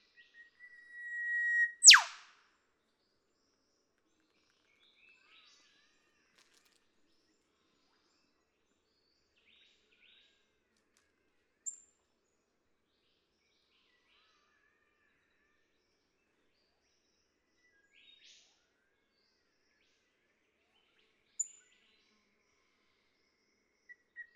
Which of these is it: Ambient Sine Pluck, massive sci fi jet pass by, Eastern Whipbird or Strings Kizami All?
Eastern Whipbird